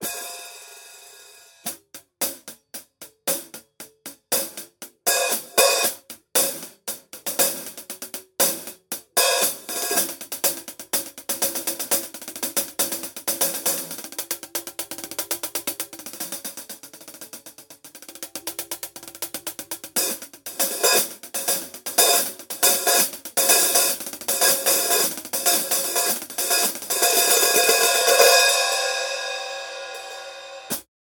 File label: Paiste PST8 HiHat